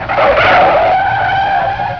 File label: skid lo; TRANSPOR